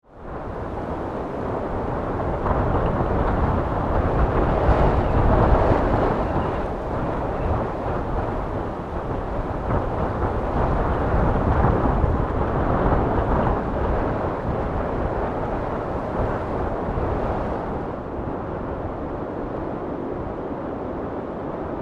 Wind Storm Heavy, Gusts; Weather; Wind (Sound Effects:Weather); BlastWave

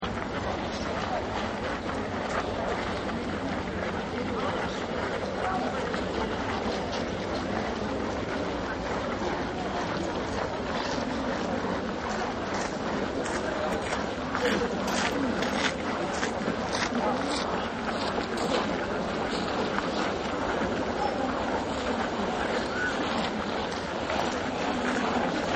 People Walking On Gravel; Crowds; Backgrounds

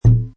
Cartoon Bonk, Muted, short, rubbery bonk; Comedy/Cartoon; Cartoon Sound Design

